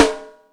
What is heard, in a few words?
Hip Hop, Yamaha DTXpress Acoustic Snare